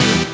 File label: Synth Shot E; Mutekki Media; Vengeance Electroshock Vol.2